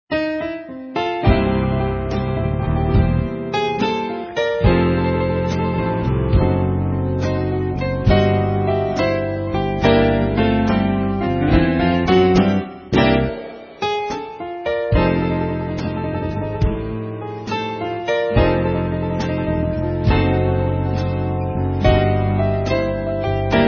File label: Night Owl Blues, jazz ensemble